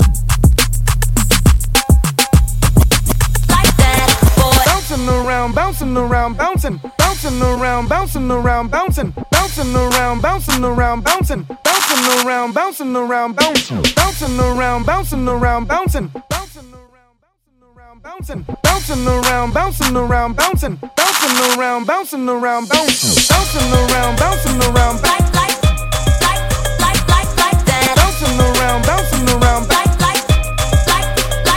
Top40 Pop Mixshow Party Break Into Re Drum 103 Bpm; Vegas Edit